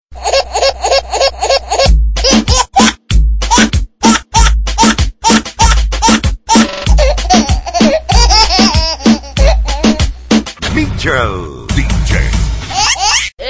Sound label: Baby Laugh remix